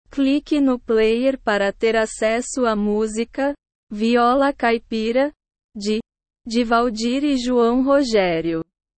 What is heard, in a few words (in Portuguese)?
viola caipira